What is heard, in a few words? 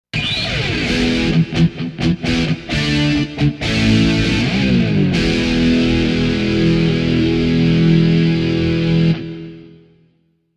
Tyros Mega Distorted Gtr